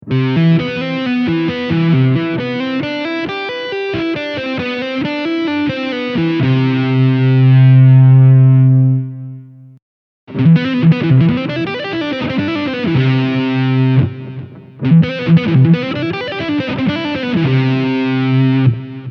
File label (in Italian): Do arpeggio, guitar